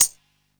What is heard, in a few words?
Tamb; AMG - Prototype Drum & Bass; Shakers & Rattles